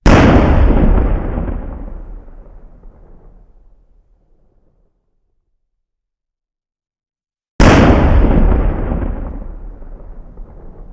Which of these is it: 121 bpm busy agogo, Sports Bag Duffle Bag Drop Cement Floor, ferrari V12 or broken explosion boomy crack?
broken explosion boomy crack